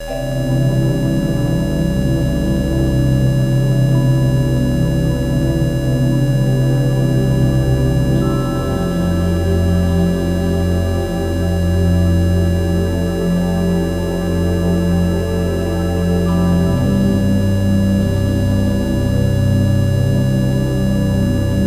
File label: Anxiety with 432 Mockingbird